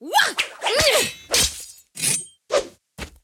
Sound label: Overseer Spattack